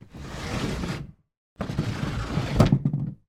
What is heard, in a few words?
window wooden sash open close, household